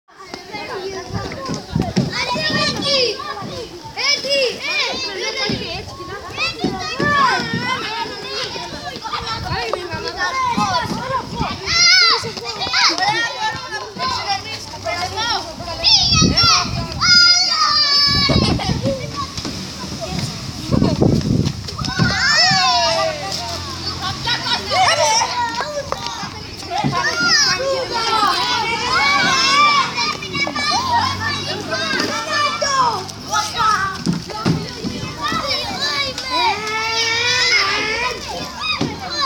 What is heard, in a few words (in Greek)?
Φωνές παιδικές ξανά στη παιδική χαρά; Παιδική Χαρά Χώρας Άνδρου δεκ 2017